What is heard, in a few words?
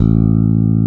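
electric bass finger A; AKAI S6000 CD-ROM - Volume 1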